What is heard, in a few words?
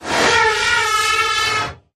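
Creature Poltergeist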